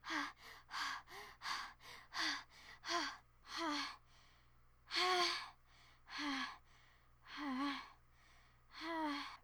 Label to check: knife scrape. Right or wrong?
Wrong